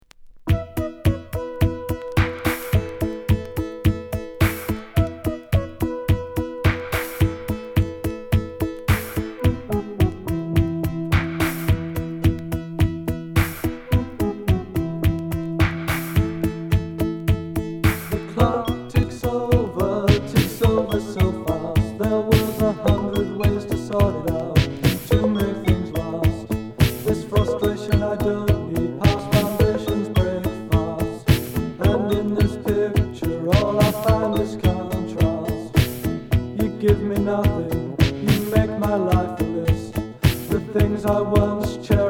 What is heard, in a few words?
the clock ticks over vocal